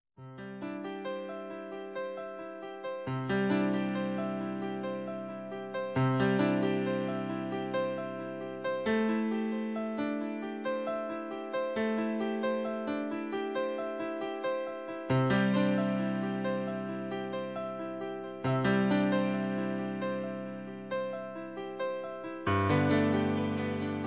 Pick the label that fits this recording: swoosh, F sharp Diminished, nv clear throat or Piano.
Piano